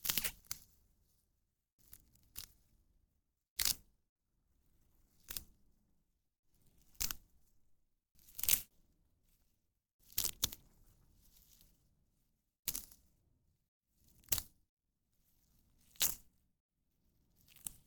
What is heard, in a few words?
weeding